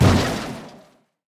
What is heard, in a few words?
Impact Car